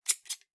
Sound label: clippers snip; garden shears